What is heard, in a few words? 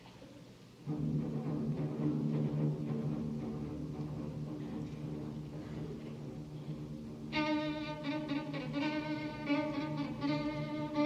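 Allegro, ma non tanto, Violin, LIVE RECORDINGS; A segment from the 3rd movement; SIBELIUS; Cembal d'amour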